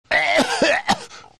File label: cough; dota; batrider